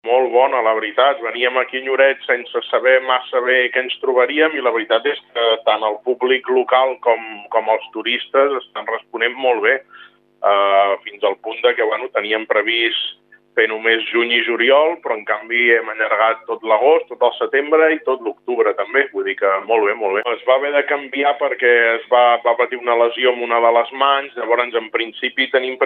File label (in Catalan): guitarra; nova radio lloret